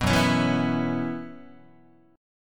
Gb 7th Suspended 4th Gb down Guitar, chord; Gb7sus4; strummed; JGuitar

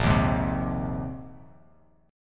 Bb 9th Suspended 4th Bb down Bass Standard; strummed; JGuitar

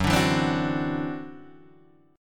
E 7th Suspended 2nd Sharp 5th, F sharp

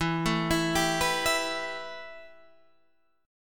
A 7th Suspended 2nd, E

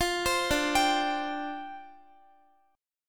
D Minor 11th D, Guitar; Dm11 arpeggiated

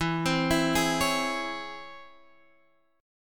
C sharp Minor Major 7th, chord

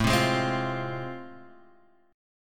A Minor Major 7th Sharp 5th, Guitar Open G

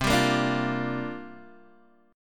C 9th Suspended 4th, Guitar, DADGAD

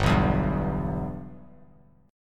G 6th; G6 strummed

